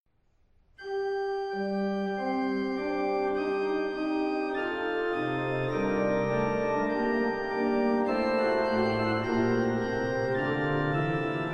Orgelvers

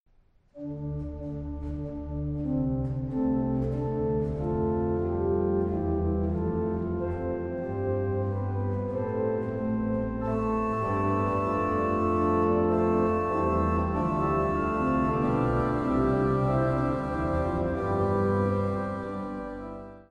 Orgelvers

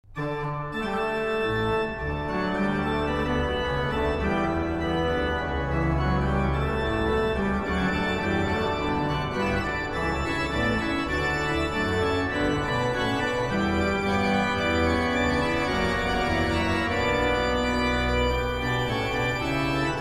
orgel

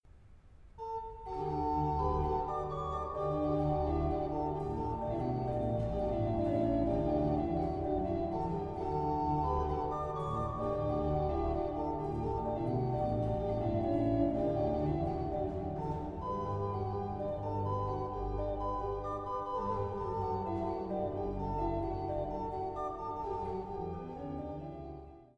Orgelcompositie Psalm

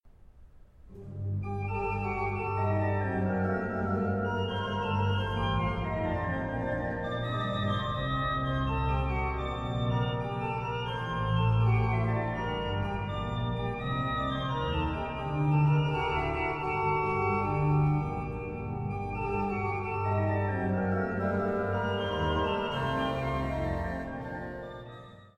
Orgelpartita; Variatie 2

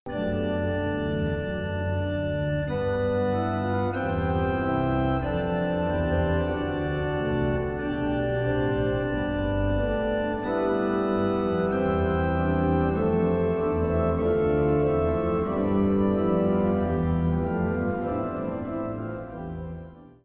Orgelvers Pedal

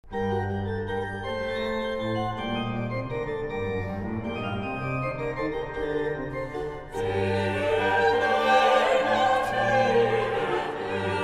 Psalm vocaal

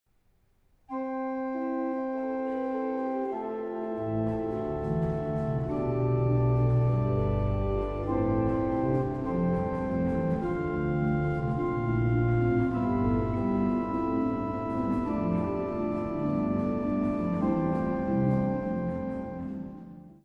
Orgelvers Pedal; Psalm 22

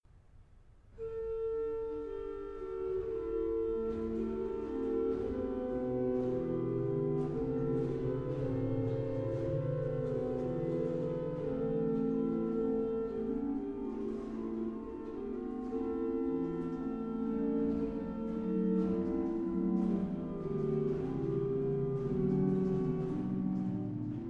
Orgelpartita; Psalm 46; Variatie 2

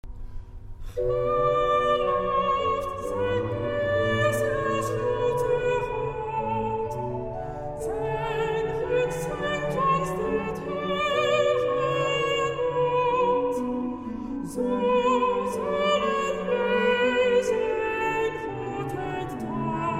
Psalm vocaal

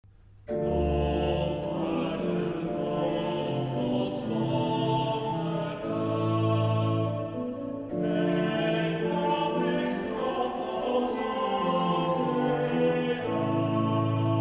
Psalm vocaal

